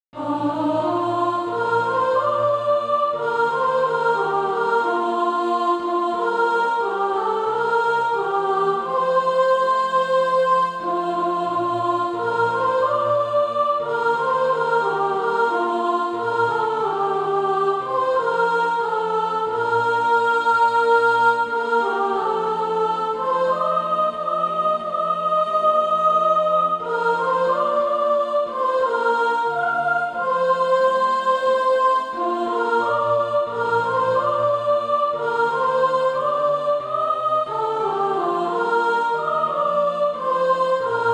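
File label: soprano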